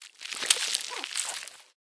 Tentacles; smite; god bakasura

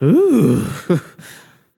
sigh, voicepack; smite; jorm

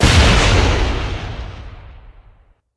robotics rocket turret fire; smite; vulcan

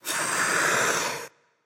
nv breath; smite; xbalanque

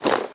armor heavy; smite; chiron

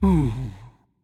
sigh, voicepack; smite; jorm

